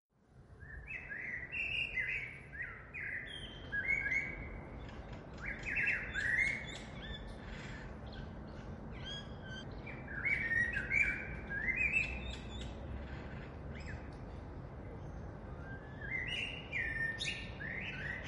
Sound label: Birdsong